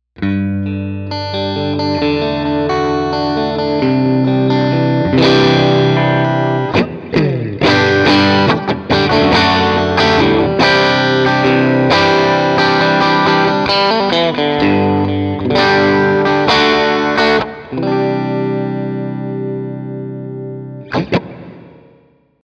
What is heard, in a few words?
Sparkling Drive; 57 turbodrive